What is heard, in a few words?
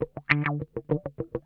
OUCH, Funk Guitar; Zero G - Funk Guitar